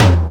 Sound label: high floor tom drum sound f key; tom drum samples